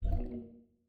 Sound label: page scroll left; STEAM; tenfoot